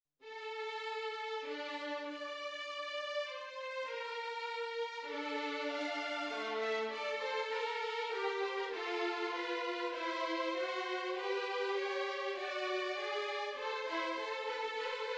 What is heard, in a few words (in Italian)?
Orchestrazione; Ronge; Gerd Prengel